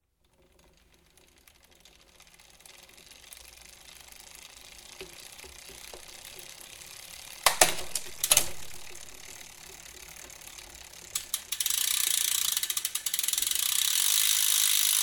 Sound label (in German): treten und schalten tune404